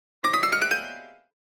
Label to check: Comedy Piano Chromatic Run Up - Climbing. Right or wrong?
Right